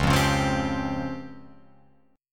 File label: C sharp Major Sharp 11th, Guitar Open F; C#M#11; strummed; tuning=Open F; guitar chord